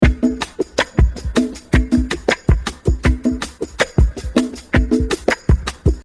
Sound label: drums